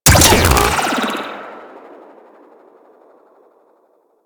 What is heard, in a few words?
Shock Rifle Alt Shot, weapons; Warframe; Corpus